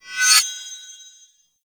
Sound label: Bayonet Melee Dash Accent; Warframe; Tenno